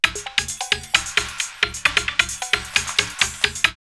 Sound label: Sweet Samba 133, drumloops, Rave; X-Static Goldmine; 90 s Sample CDs